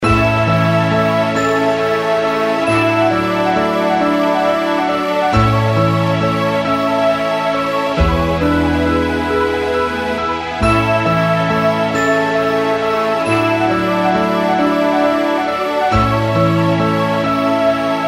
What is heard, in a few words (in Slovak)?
zvonenie Android